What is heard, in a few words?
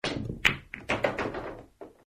pool break; angels&dogs